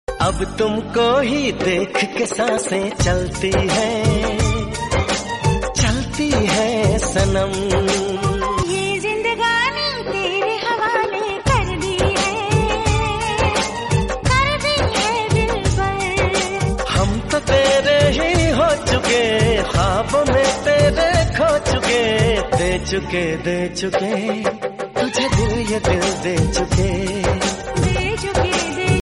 bollywood song tone ringtone

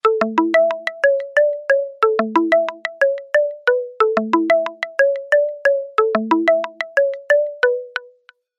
alcatel dots ringtone

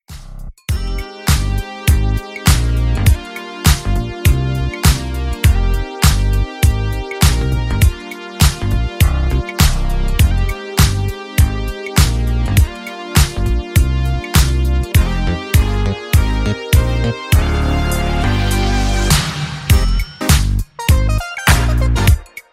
funky ringtone; iphone

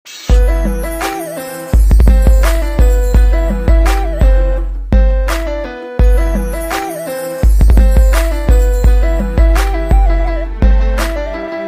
cool flute beat ringtone

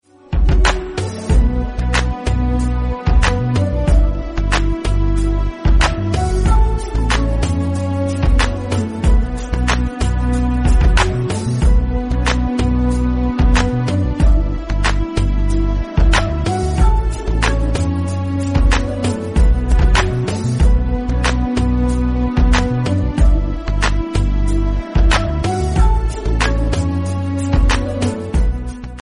romantic instrumental phone tone

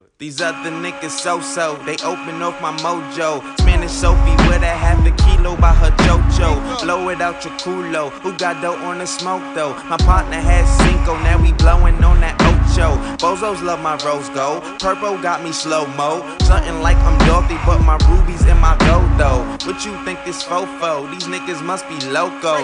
bass ringtone

